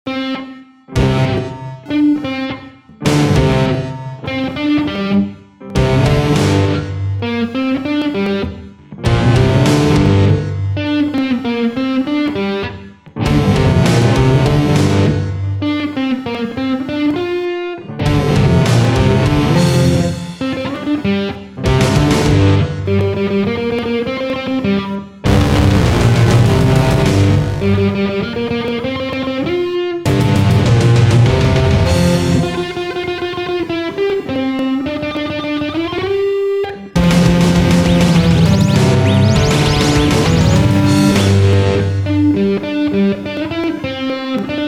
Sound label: power command; weeklybeats 2024